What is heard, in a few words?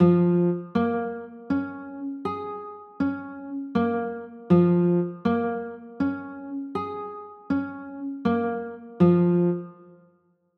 b flat m, chords; b flat m7